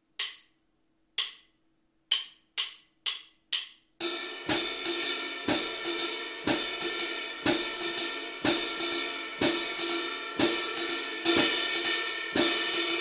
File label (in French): swing medium laid back vs serré